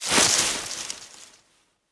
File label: Field Mat Tap; Yu-Gi-Oh! Master Duel